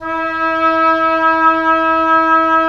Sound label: WND OB P, Oboe p; Roland - LA Composer vol. 4